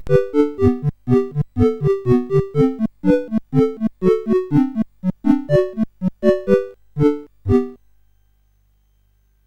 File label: sid, CSG8580R5; Aquantor